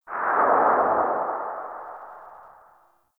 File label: Void Move; Warframe; Focus; Operators; Operator Wraith Movement